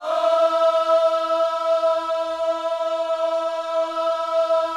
OHS E 4, Gospel; Spectrasonics; Vocal Planet Vol.1 Gospel; akai

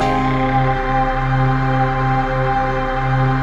ATMOPAD; Best Service; XX Large; Pads

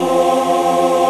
VOICE PAD, ATMO PADS; Best Service; XX Large

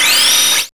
SYN FX; E-Lab; X Static Goldmine 1

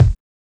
BD; E-Lab; X Static Goldmine 1

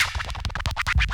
SCRAT, VNL FX; E-Lab; X Static Goldmine 2; akai

